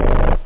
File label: terror, drums bass; OctaMED SoundStudio V1